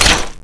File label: cross draw, weapons